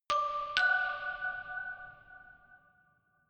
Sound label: notification glockenspiel creepy third up